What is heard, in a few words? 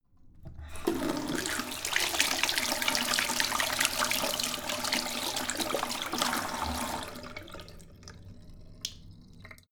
sink medium flow wash turn off on; haus garten; badezimmer